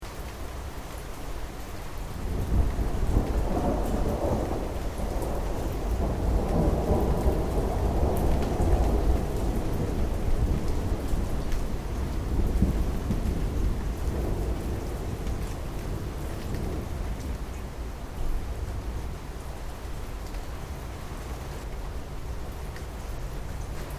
Ambience Japan Thunder Heavy Rain Slight Wind Noise; Japanese weather Ambience; Thunder Sound Effects; Thunder (Sound Effects:Weathe; Foundation1